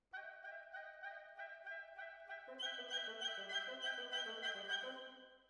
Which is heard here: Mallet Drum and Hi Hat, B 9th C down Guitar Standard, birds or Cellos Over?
Cellos Over